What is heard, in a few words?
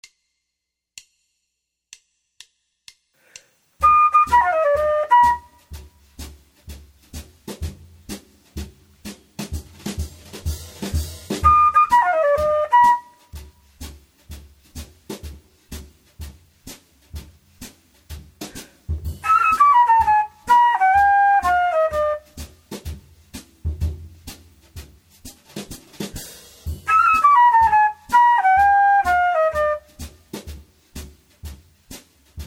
bb major penta